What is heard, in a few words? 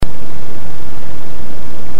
noise